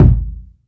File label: roomy kick sample g sharp key; Duration 0:01